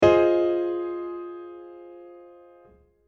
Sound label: Aural Tests Recognition of Chords; Grade 5; pop vocals